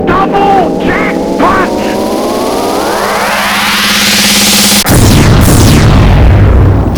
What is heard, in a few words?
jackpot x2